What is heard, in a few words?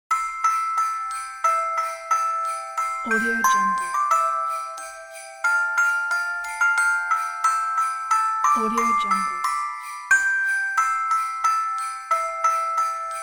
christmas jingle bell loop; applehillstudios